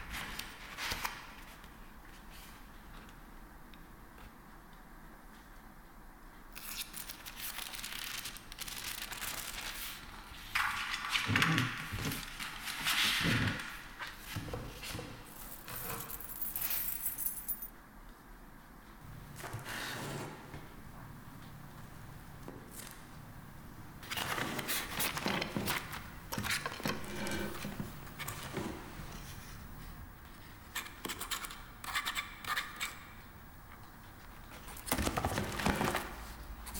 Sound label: medieval office loop, ambiences; michael ghelfi